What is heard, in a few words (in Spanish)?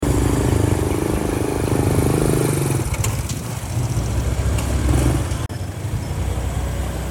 moto